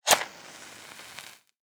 match strike

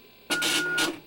printer

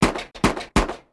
rev shots

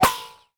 bullet ricochet

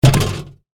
plate hit rattle

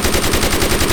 Machine gun burst

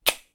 soda can

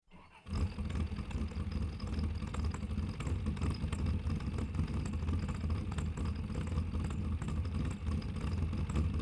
musccle car start idle

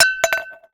metal cup drop

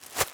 plastic bag shake

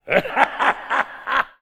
evil laugh echo

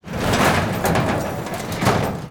metal gate

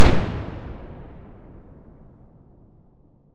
light cannon shot

